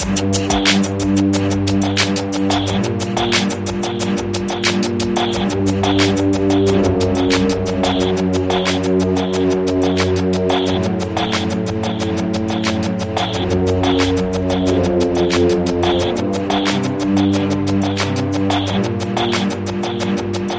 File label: creepy killer tension building suspense music